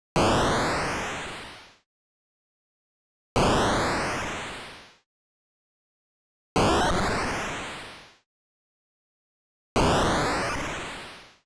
Rocket Launch Sound Retro 8Bit, A medium-length sound of a rocket taking off in a chiptun; A medium-length sound of a rocket taking off in a chiptune audio